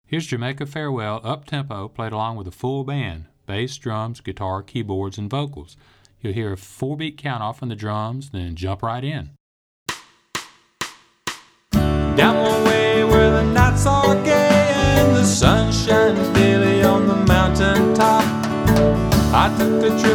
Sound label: Fast